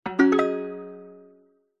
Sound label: multimedia game sound plucked accoustic and mallet positive tone